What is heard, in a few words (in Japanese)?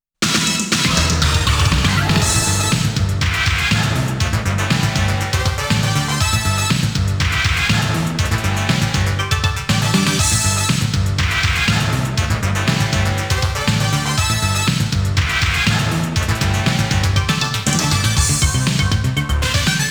ステージ BGM; konami amusement sounds 93 summer